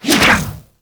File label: Ball Throw Whoosh; Missions; Tenno Ball